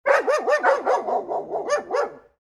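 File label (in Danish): hundegøen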